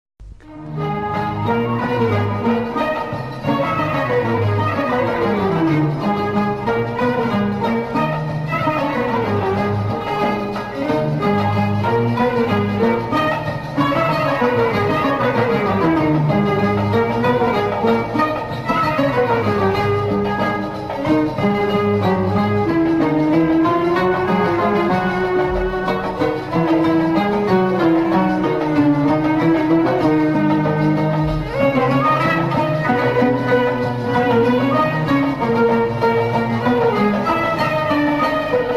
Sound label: Turkish Longa